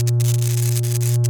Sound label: electricity device; firefight